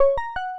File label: Confirm